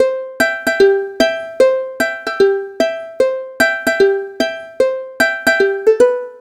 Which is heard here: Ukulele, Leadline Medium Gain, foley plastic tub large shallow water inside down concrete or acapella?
Ukulele